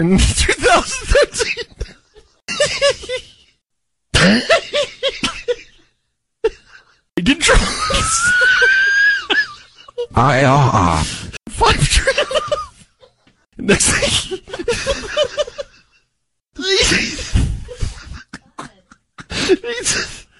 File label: giggles